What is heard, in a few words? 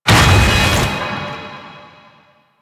Undertow Impact; Warframe; Warframes; Pirate